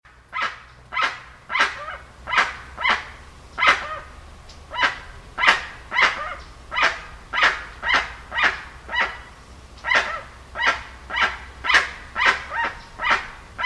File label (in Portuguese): Jacuaçu